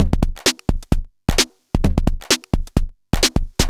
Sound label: Beat (130BPM), techno; techno drum fx